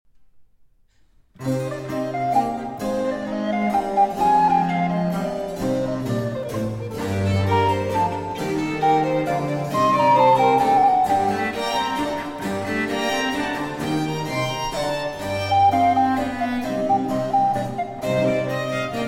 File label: Sonata For Recorder, 2 Violins & Continuo in C Minor Andante